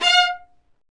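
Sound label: Marcato, Strings; Vlas Marcato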